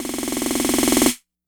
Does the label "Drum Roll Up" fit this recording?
Yes